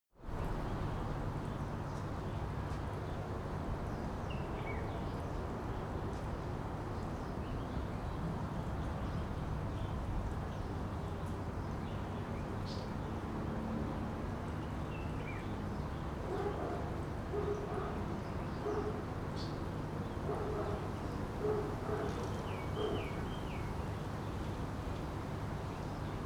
afternoon traffic water ventilation

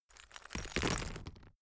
stone clatter

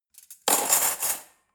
cutlery forks drawer place soft

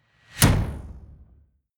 incoming flutter echo

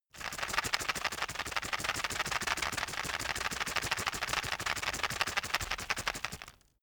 plastic drug blister shake

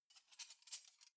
cutlery knives handle very soft

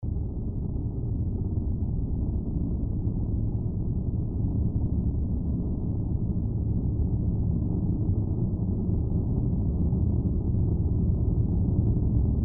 deep space room atmo

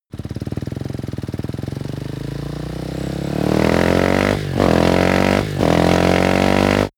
motorcycle motorcross idle start ride

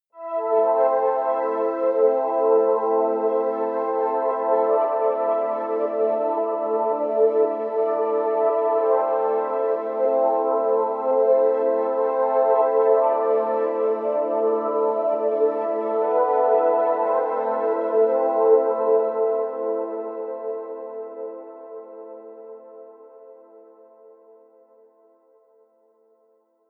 sequence reverb pad arpeggio

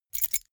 key ring small grab hard

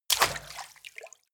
step water run in

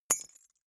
key ring small drop carpet soft

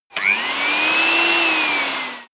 sander free short soft

